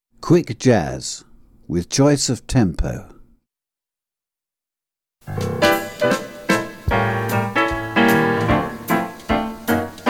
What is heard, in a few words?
Dance sequence Quick jazz tempo; tap